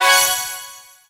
Goal; ARMS

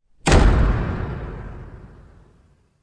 Door Slam; Decay: The Mare

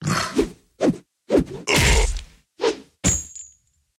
Cyclops; Puzzle Chronicles; PS3; PSP; X360; SFX 3D Cyclops Spattack

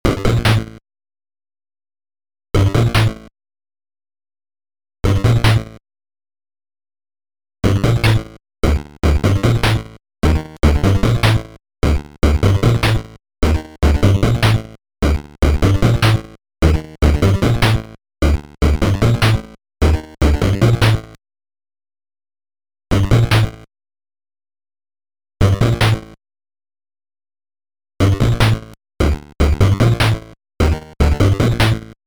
Boss Dialogue; Cosmo Police Galivan; NES

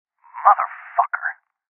Sniper Miss; This Is the Police 2

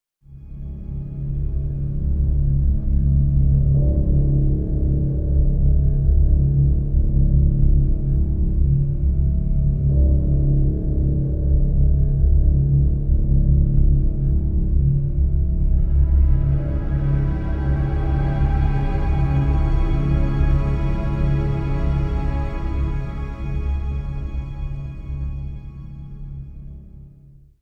Horn Backing; Title Cards Ep04

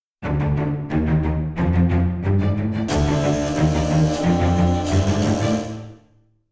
Combat In; The Witcher 3 Wild Hunt; Skellige

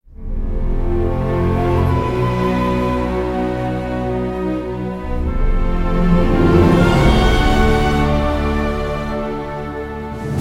Monument Reveal; Avant Gardens; lego universe macos windows gamerip 2010

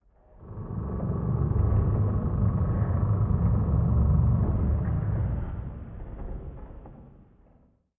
Ambience woodcreaks

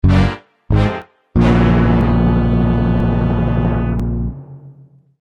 sting dun dun dun; Strong Bad's Cool Game for Attractive People

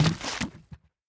Drop Bleach; Devour; The Inn; devour 2021 gamerip pc macos

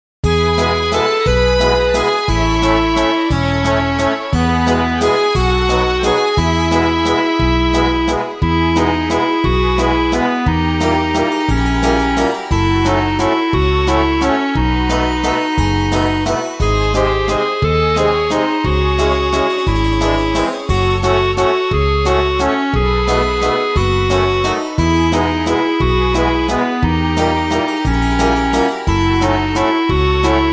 High School Anthem (Instrumental); RenRen High School

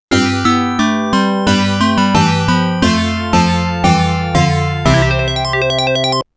Game Over (No Voice); SNK Arcade Sound Digital Collection Vol. 10

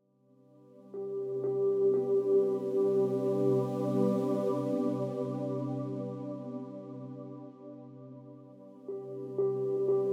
90bpm Cmin Bbmin

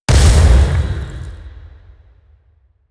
explode; light rune explosive

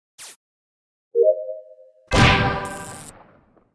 Startup; Friday Night Funkin' Vs. Impostor V4

